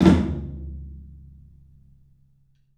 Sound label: TOM, 3D Drums, CLUB TOM; 3D Sound; CLUB 14TOM